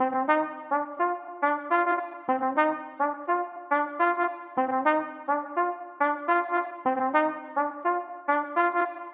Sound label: D#maj 105BPM Sax, Music Loops; 99Sounds; Monosounds; PANDORA BOX!; Miami Beach